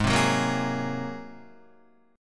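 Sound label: A flat 9th A flat Guitar, chord; Ab9 strummed; JGuitar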